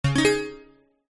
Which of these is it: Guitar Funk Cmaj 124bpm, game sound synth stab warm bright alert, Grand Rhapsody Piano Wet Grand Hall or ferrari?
game sound synth stab warm bright alert